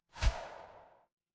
transition whoosh

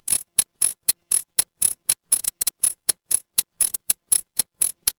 ui loading

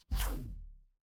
retro game laser

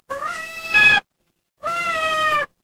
animal cat meow

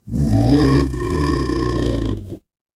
horror growl

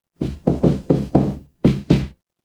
footsteps wood

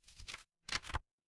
paper fabric page turn

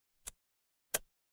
mechanical switch

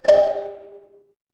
feedback confirm

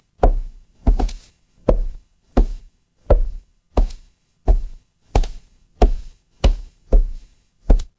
footsteps wood

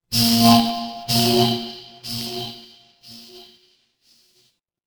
fire electric zap

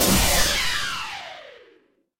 magic scifi laser

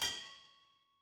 impact metal hit

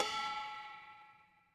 instrument gong

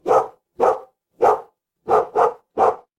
animal dog bark

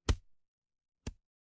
impact punch

